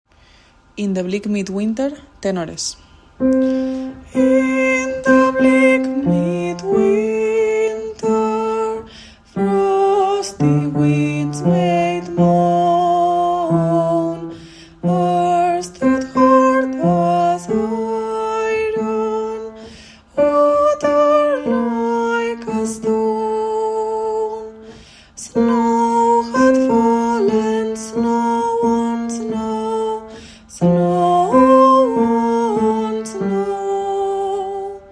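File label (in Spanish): midewinter tenor; Navidad JOCSMAB 2023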